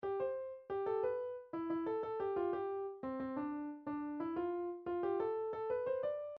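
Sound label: Soviet hymn, midi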